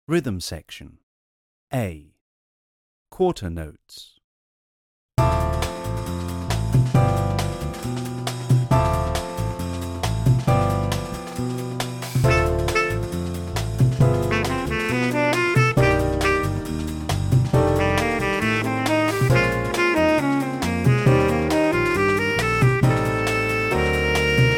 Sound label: Quarter Notes, tap